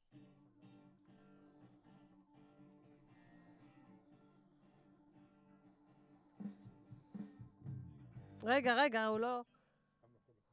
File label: VOC GUIDE